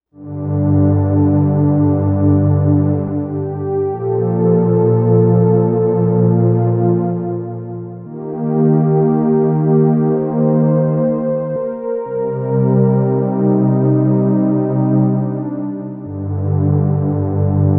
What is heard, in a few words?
Somber Pad